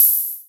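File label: hi-hat open, drum machines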